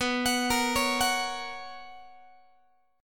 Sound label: B Major 7th Suspended 2nd Guitar, chord; arpeggiated